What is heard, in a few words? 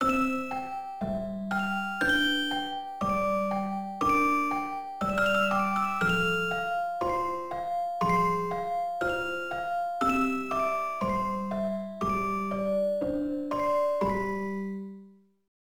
Music Box; Tales of Eternia